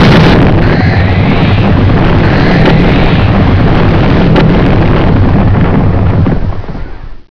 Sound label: big bang; quake; fortress